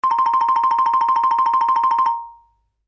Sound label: Xylophone Trill; Mallet Sound Effects; Cartoon